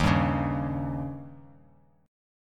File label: D sharp 7th D sharp; strummed; JGuitar